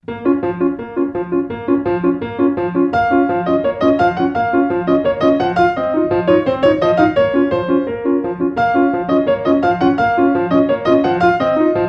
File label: Piano